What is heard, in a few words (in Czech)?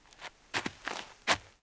preslap trava; Mafie 1